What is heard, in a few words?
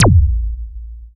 FX, attacks